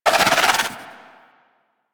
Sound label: Fairy Dust Cast; DE; Warframe